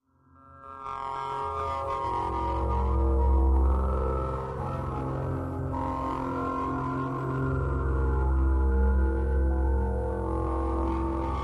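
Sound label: Inharmonic Drone Atonal, energy beams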